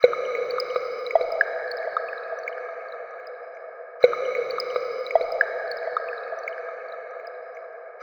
bubble

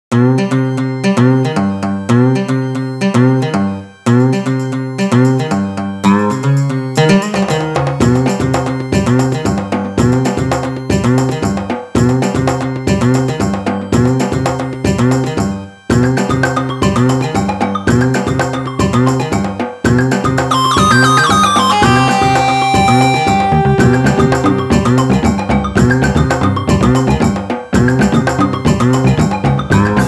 jungle

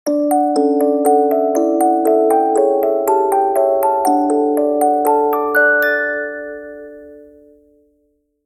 Chime

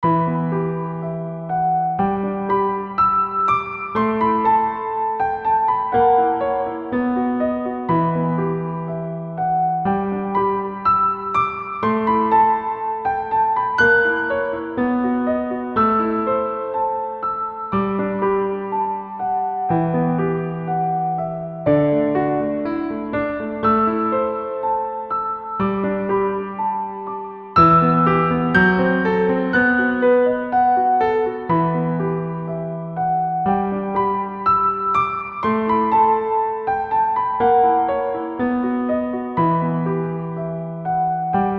piano

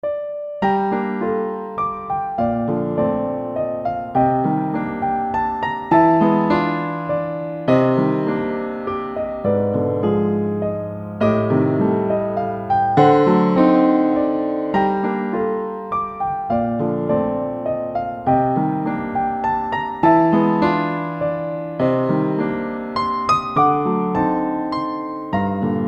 piano; 故郷の岬ではアルケナーが輝いていた。 想いはピアノの旋律となって夜の緑野に零れ落ちる。

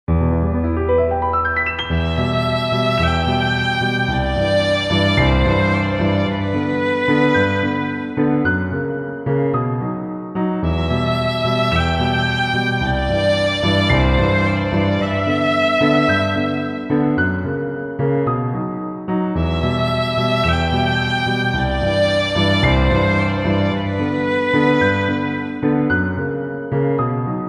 Train

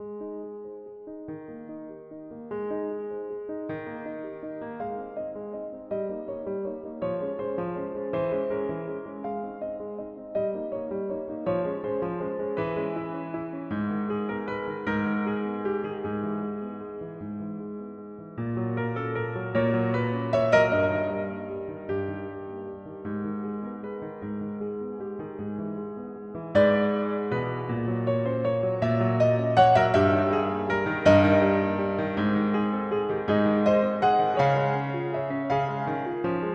piano

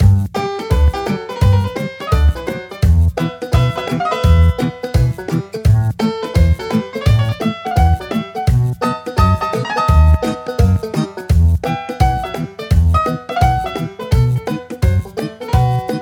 Kowareta PIANO; 酒場の壊れたピアノ; 活気があって楽しい酒場のイメージです。; ウェスタン

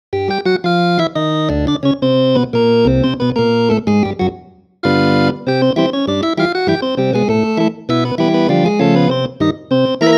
Organ

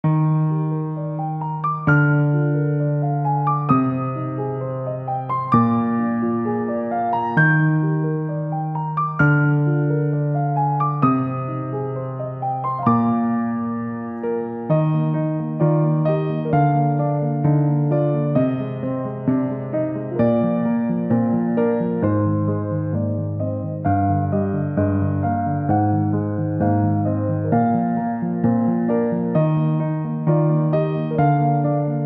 piano

